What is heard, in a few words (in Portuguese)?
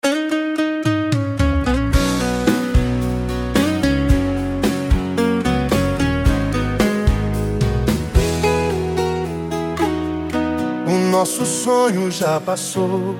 sertanejo